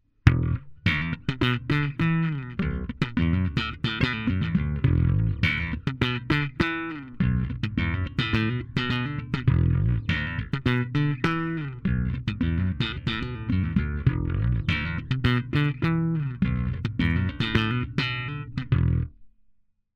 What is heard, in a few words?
clean slap